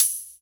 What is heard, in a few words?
Open Hihats, Cymbals